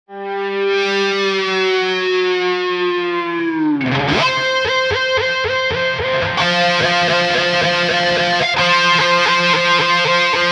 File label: Intro Left; Mr. Tabs